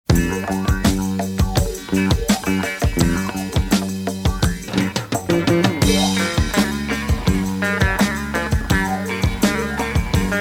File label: elektro saz